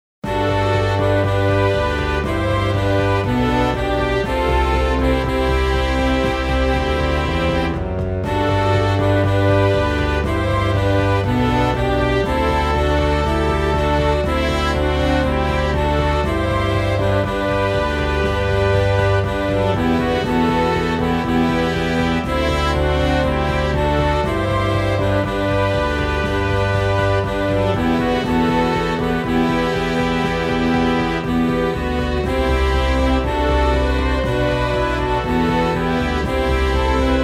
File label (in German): Slow Fox Tutti